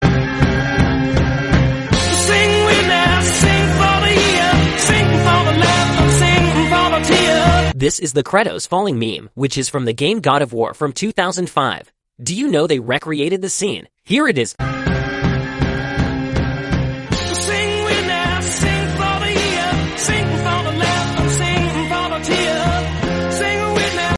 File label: falling bgm; kratos